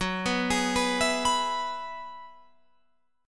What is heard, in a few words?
Gb 7th Suspended 2nd Suspended 4th, Guitar, Open F; Gb7sus2sus4 arpeggiated